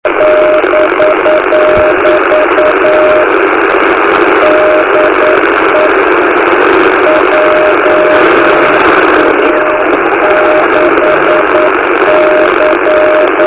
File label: beacon